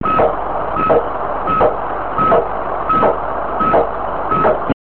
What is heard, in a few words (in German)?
piep piep; Simple beep-beep signal